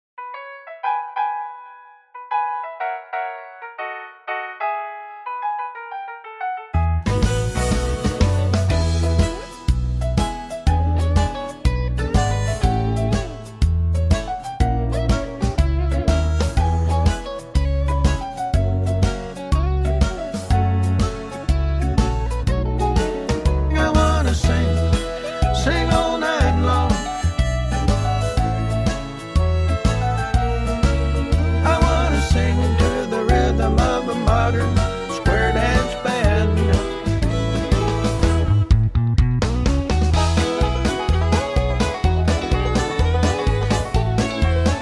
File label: harmony